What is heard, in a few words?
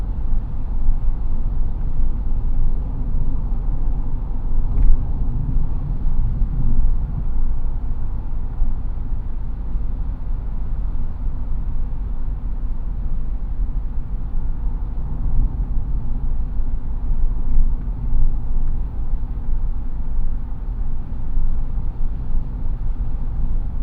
Full Size Car 130, Binaural, Background Noise; TS 103 224 Background Noise Database